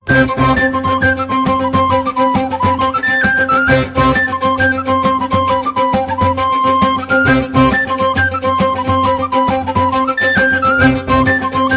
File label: Rocking Chair Jigs